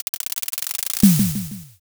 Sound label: drumroll